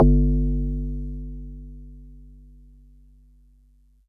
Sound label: Marimba DX100 G2